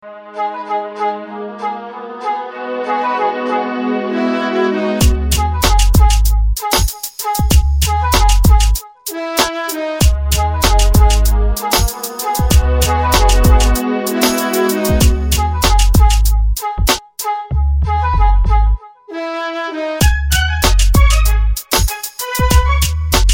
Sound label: Phone Intro 3d